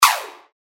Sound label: shot; ショット3; アナログ効果音; サイザーで制作したアナログ効果音です。